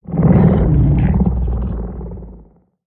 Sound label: Purr; creatures pets